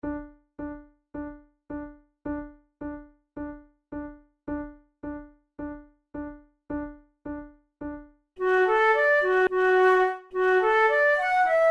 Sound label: duet, flute